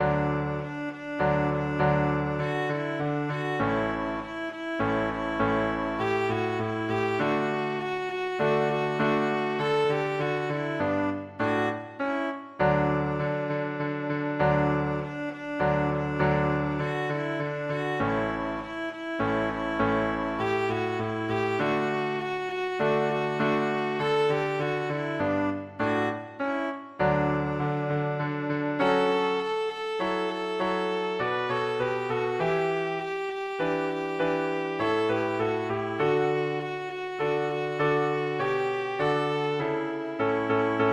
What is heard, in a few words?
fanfare